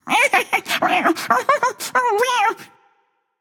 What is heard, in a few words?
laugh, voicepack; smite; jorm